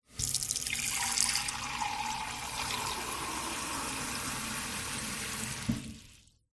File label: household kitchen mug fill water from tap